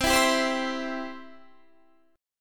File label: C Major, C, down, chord; strummed